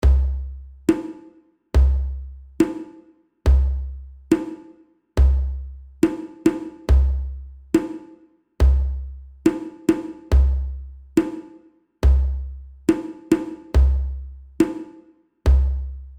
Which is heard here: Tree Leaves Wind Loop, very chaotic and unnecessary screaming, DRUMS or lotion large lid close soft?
DRUMS